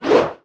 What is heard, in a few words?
Sword Jump Attack